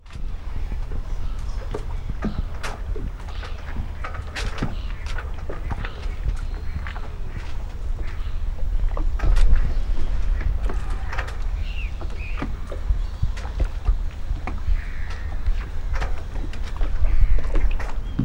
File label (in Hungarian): hajó himbálózás